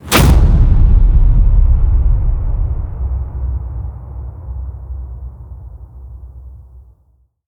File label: Lose Game